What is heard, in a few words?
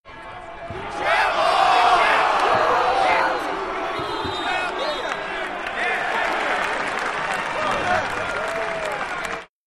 Basketball Travel, sports arena